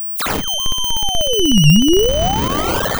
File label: pop